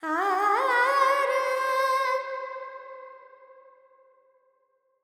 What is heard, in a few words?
Verb Charukesi, Carnatic Female Alaps